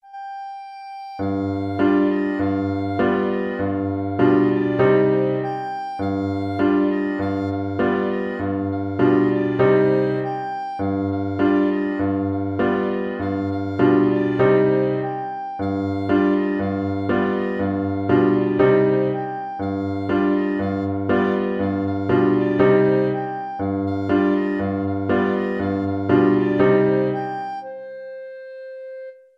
kalinka, clarinet

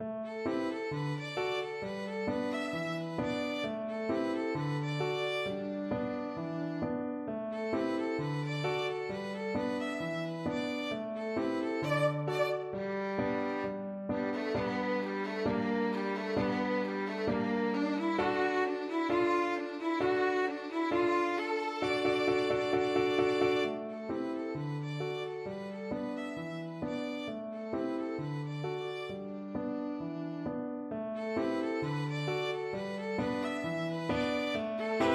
rag, violin

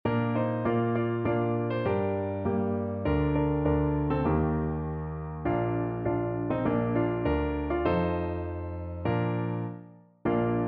French Horn; George Frederick Root - Battle Cry of Freedom (Rally Round The Flag)

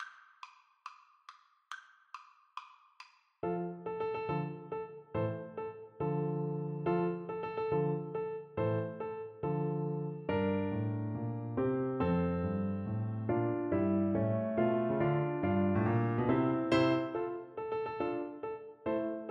trumpet

